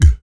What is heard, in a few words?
VOC PERC, Vocal Planet Jazz FX BASS PERC